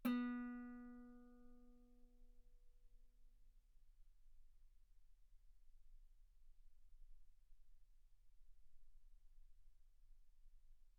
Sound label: Guitar ff sul B B3